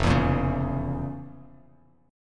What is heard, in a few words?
F Major 7th Suspended 4th Sharp 5th F, Bass Standard; FM7sus4#5 strummed; JGuitar